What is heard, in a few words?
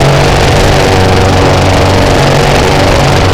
Automatic GSh301, Weapons; DCS